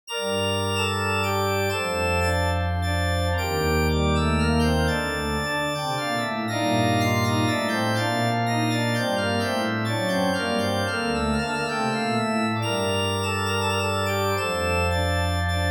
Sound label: Church Organ C, Minuetto in g minor, reverberated; Andreas Instrument Sounds AB